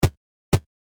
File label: Video Game Footsteps Walk Loop Vintage Eight Bit; Technology